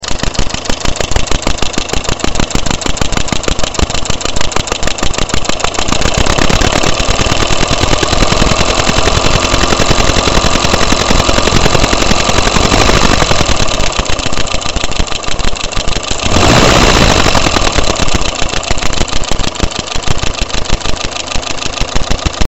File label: rear engine, funny knocking sound